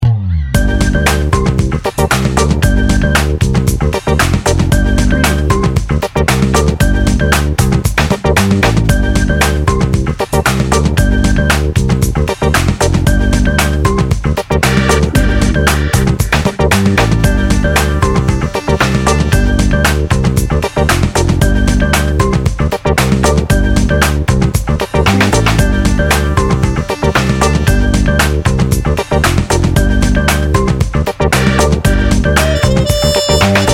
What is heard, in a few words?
sleek and confident